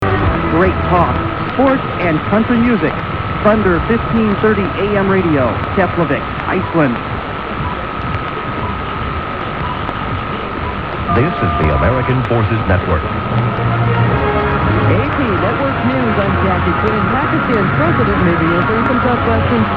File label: thunder; 1530 Iceland